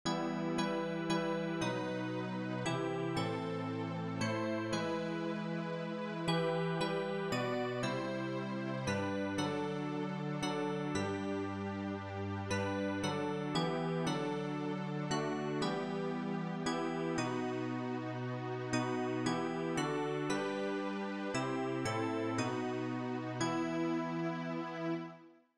CLAVIERS; ALLELUIA de Schütz

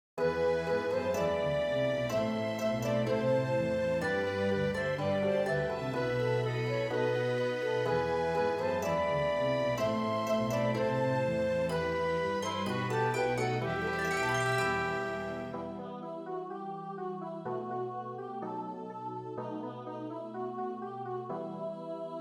ORCHESTRE

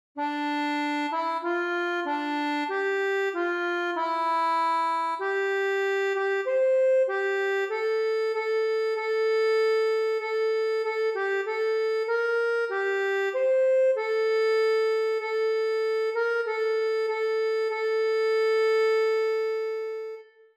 ACCORDEON; ANAMNESE St Jean

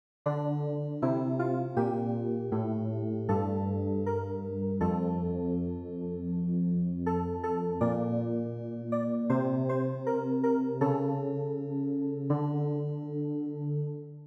CLAVIER; Psaume129

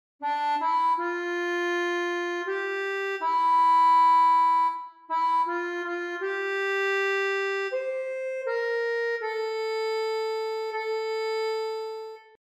ACCORDEON; Garde Moi Mon Dieu

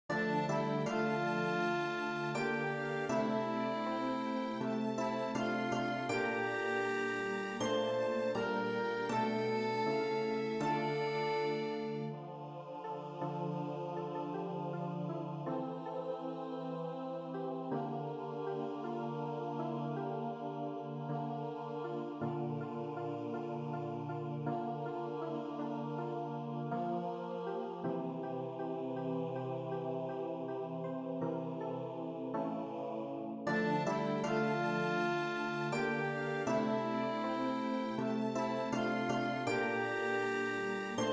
ORCHESTRE; Garde Moi Mon Dieu

